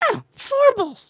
sway, emotes

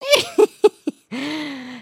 just kidding, emotes

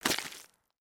Foot Mud Walk Light, footsteps

